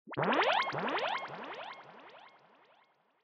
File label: Scene Fantasize Delay Long